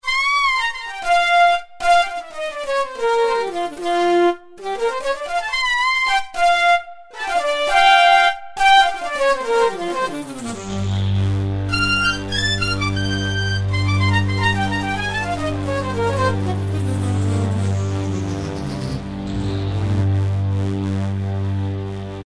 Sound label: Multi Lead, Kurzweil K2000; Kurzweil K2000 Contemporary ROM; Contemporary ROM Programs and Demos diskette; SYNTHTEX